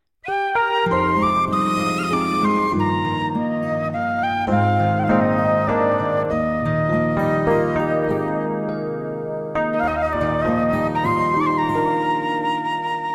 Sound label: Celtic Carol